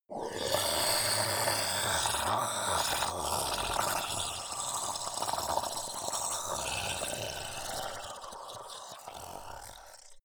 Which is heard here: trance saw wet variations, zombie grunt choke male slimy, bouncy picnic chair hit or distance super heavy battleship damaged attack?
zombie grunt choke male slimy